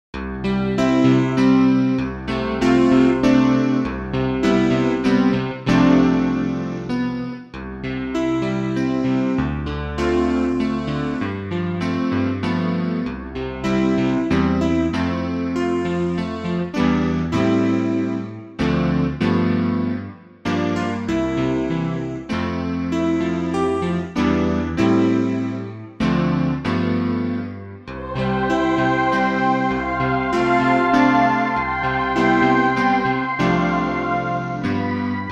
Pista instrumental sin melodia

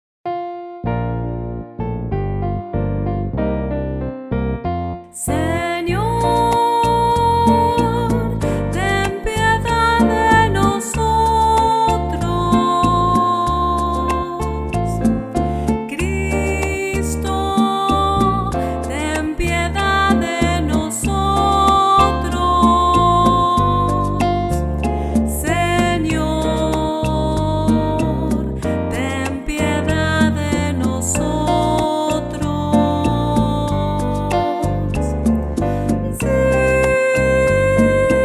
Kyrie Misa Cubana, Canta

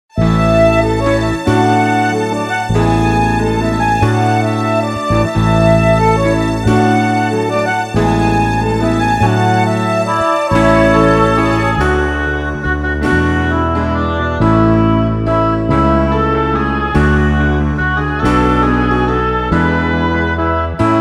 Pista con melodia instrumental

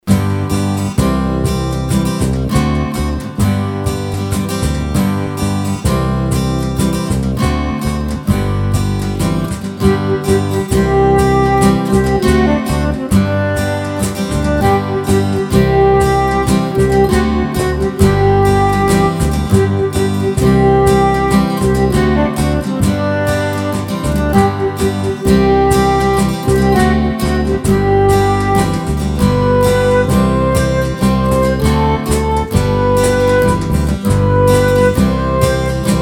Pista con melodia instrumental

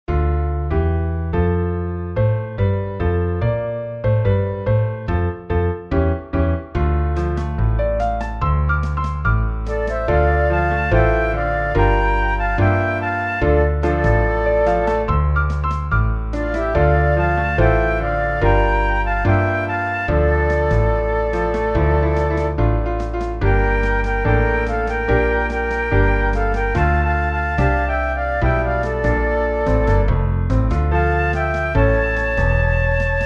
Pista con melodia Instrumental

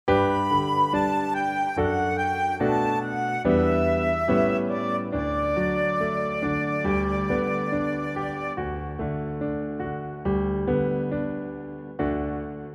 Pista instrumental sin melodia

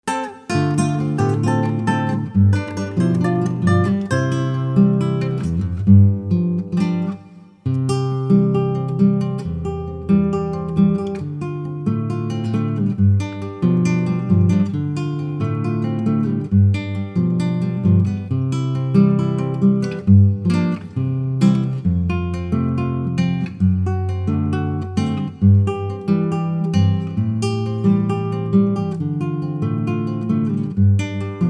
De Todo lo que nos das. Pista instrumental sin melodia

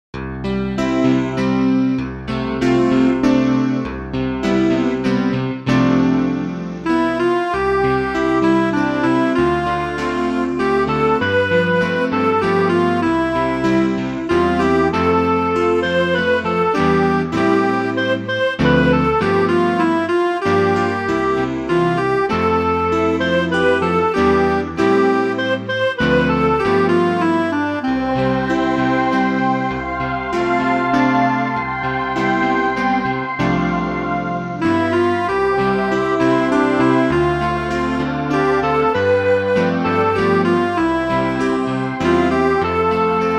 Pista con melodia instrumental

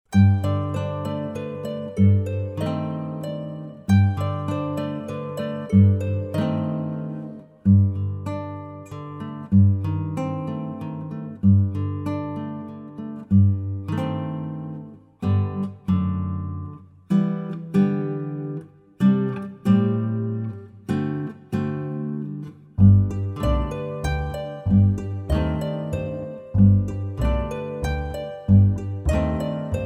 Pista instrumental sin melodia; Kyrie eleison

